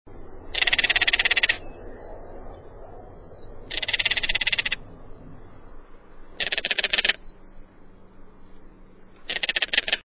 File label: Crested Caracara